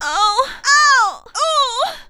female choke

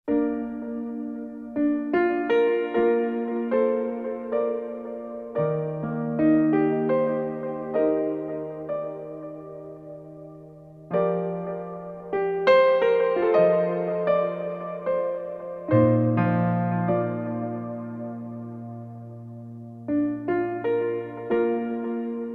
Theme-Piano Ver.; Xenosaga Episode II Jenseits von Gut und Böse; Sakura

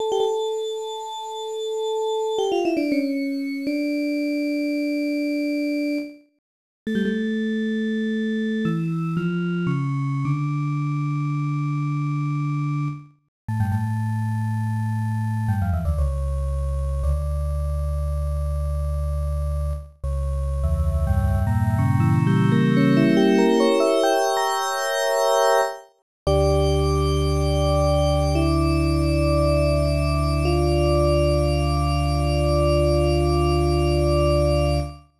Toccata and Fugue in D Minor, Apple IIGS; King's Quest II Romancing the Throne; Dracula's Castle

